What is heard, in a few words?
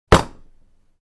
Barbell drop on the ground